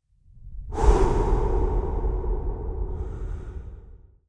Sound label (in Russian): veter vselennoj; Звук ветра; Звук ветра для перехода в видео или для монтажного перехода в аудио монтаже